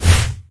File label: Vehicles Hoverboard